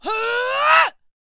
Shouter Attack Vocals

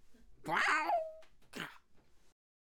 Balloon Archer Attack Vocals

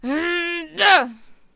Bomb Thrower Attack Vocals

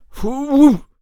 Protector Attack Vocals

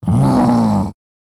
Minotaur Attack Vocals

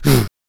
Stoner Attack Vocals

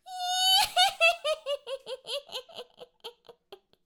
Attack Vocals; Pumpkin Catapult

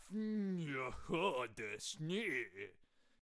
Vlad Attack Vocals

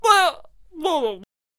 Potionseller Death Vocals

